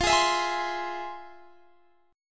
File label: F Minor Major 7th, Guitar Standard, chord; FmM7 strummed; JGuitar